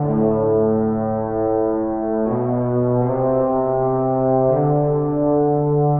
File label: Trombones; тромбон